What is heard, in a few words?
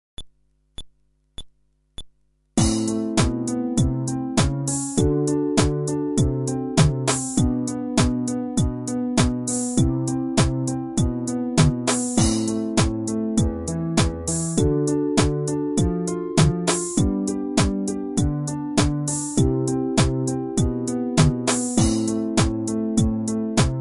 Groove, fretless bass